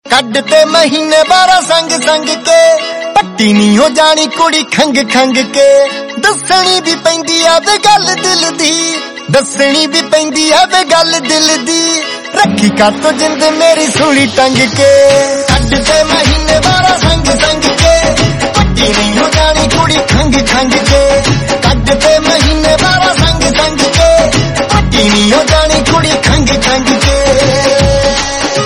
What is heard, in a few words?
punjabi song